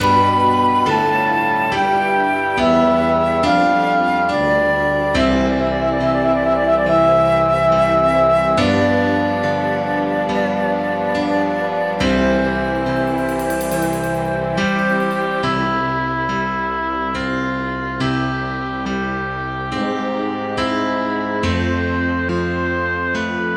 Sad song

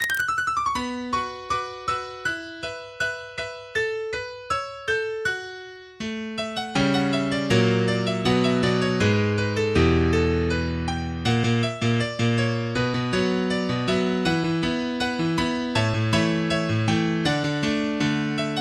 piano

